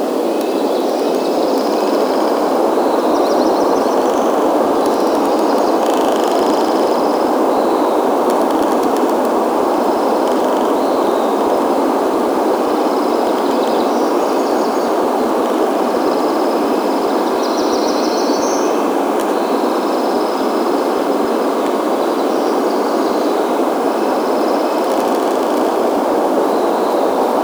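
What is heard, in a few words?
Forest Ambience Loop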